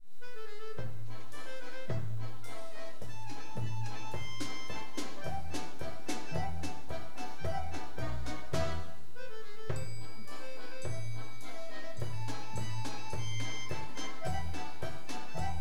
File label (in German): Akk Tuerkischer Marsch